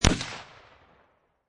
Rifle Winchester 30-30 Single Shot Exterior At 45'; Rifle: Winchester 30-30, Single Shot, at 45 feet; Rifle Sound Effects